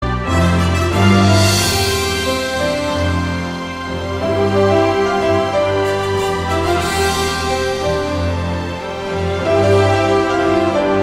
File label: instrumental; from hercules